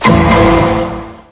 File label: doink doink